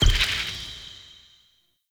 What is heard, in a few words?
Thorn Bow Bird Arrow Impact Default; Weapon Impacts; Player; Bows; Envoy Fey Bow